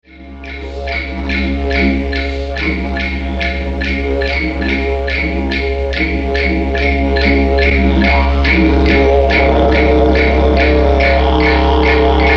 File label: didj; shamanicmusicstore